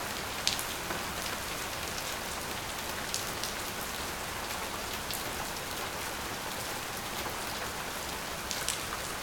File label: Rain Light Hard Surface Loop